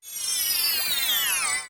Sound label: Warp Portal Out; OOT